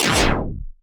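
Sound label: LASER SHOT, Anime Sound FX, SCIFI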